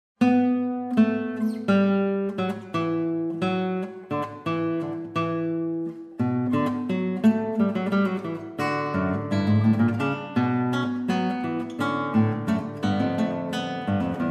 Studio Polifonico No. 10 In B Minor, Classical Guitar